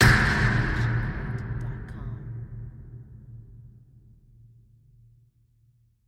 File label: designer sub boom medium and scream hit; SoundsCrate